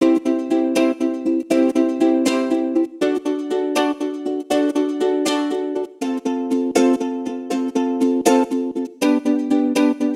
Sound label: UKULELE Pattern 80 BPM; monster ukulele midi pack